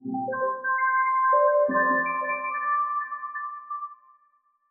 glitch C maj; fx; bespoke - Floral v.1

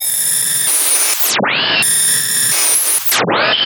Eerie transmission; SFX; 7rystan's 600+ FREE EDM SAMPLES & DRUMS

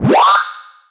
Synth

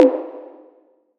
Wood hit reverb; SFX; One shot; 7rystan's 600+ FREE EDM SAMPLES & DRUMS

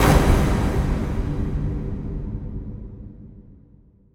Impact

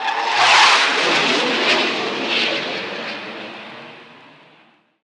Airplane; SFX; 7rystan's 600+ FREE EDM SAMPLES & DRUMS

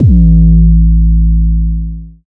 Trap bass, EDM

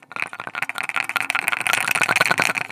Bobblehead shake, Foley; SFX; 7rystan's 600+ FREE EDM SAMPLES & DRUMS

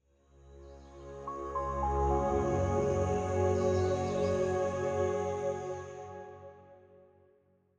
botanical swell Db 108bpm; bespoke - Floral v.1

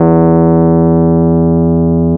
Rhodes E4; Instruments; 7rystan's 700+ FREE COLOSSAL EDM SAMPLE PACK

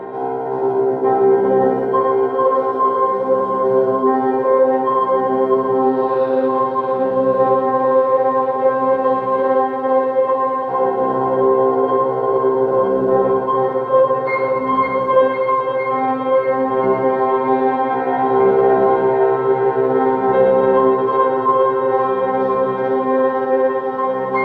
piano orchestra; bespoke - Floral v.1

